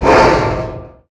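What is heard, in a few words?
Collision Interior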